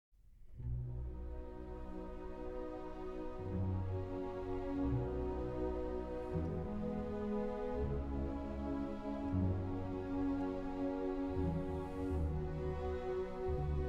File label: Piano Concerto No. 5 in E-Flat Major; Op. 73; Beethoven